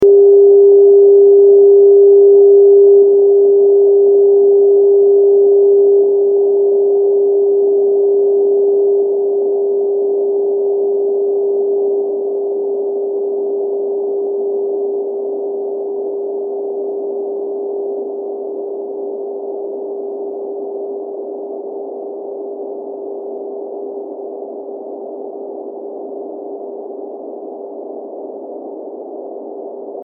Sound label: 3db steps into noise